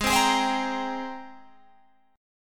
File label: G sharp Major 9th G sharp, Guitar Open G